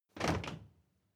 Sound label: step crowd march normal stop; schritte